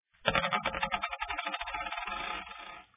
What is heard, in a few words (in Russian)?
zvuk monetki